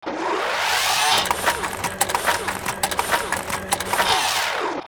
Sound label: presentation; Toontown Online